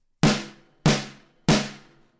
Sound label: Snare